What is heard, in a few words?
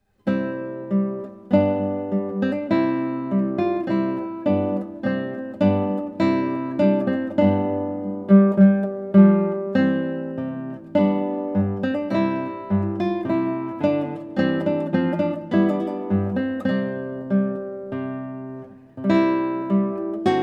Trumpet Voluntary